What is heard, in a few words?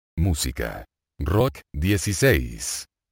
rock